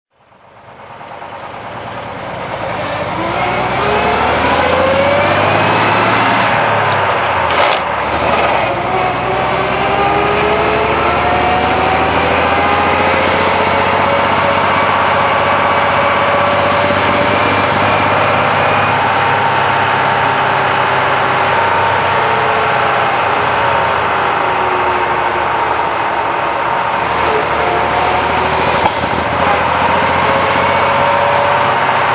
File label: buick master six drive